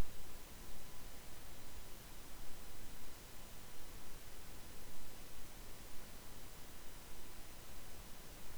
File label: Realtek mic 30dB